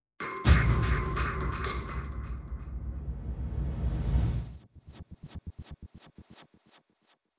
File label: warren suspense mood, music; inFamous; PS3